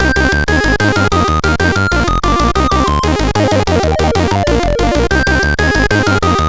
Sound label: Test Arpeggio, sid CSG8580R5; Driver 11